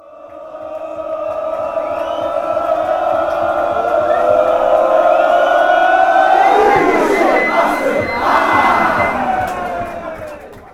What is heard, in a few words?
goal kick fanchants; morecambe